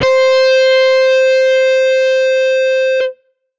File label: guitar electronic, distortion guitar